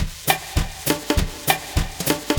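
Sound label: Lounge Drum Loop 100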